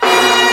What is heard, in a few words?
MAJOR, FAIRLIGHT CMI IIX STRINGS